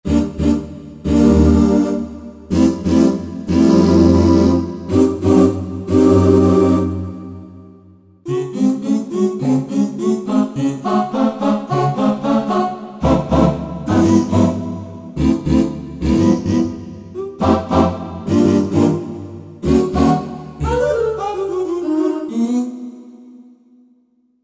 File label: Take Voices, Korg TR-Rack